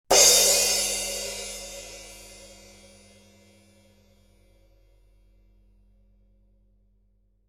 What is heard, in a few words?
16in Crash, cymbal; ZBT